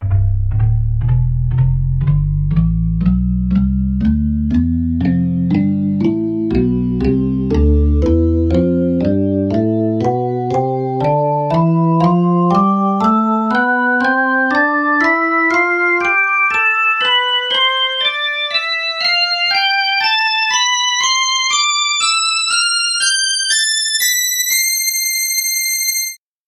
percussive organ tonleiter samples

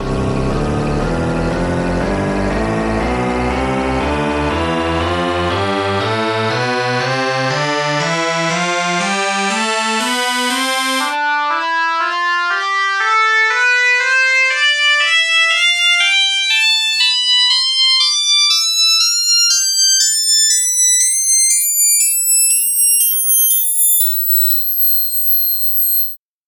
bagpipe tonleiter

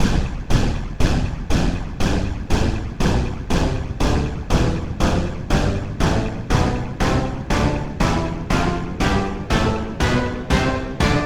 orchestra hit tonleiter

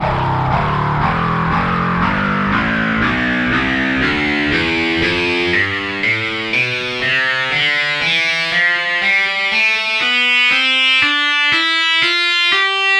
overdrive guitar tonleiter

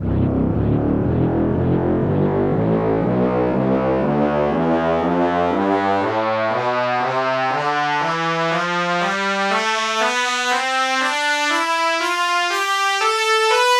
brass section tonleiter

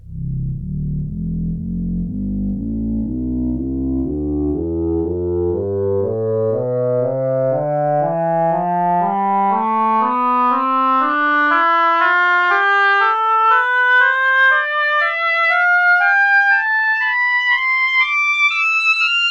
oboe tonleiter samples

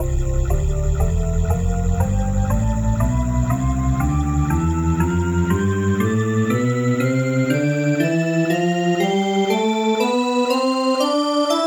bowed glass tonleiter

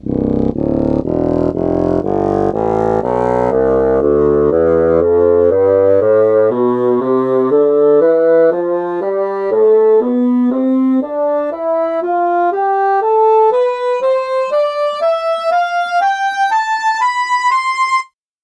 bassoon tonleiter

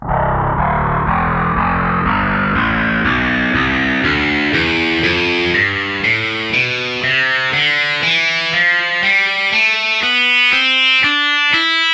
overdrive guitar tonleiter samples

